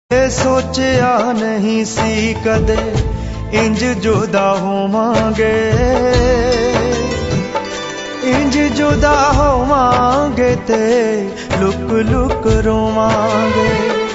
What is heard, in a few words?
Mobile tone